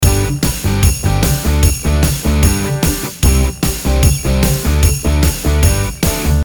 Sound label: Christmas Advertising - Loop